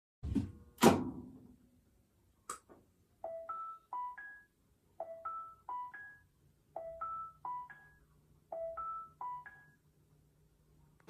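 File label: end alarm, washing machine; زنگ تمام شدن ماشین لباس شویی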